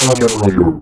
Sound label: death